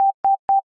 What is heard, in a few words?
three boops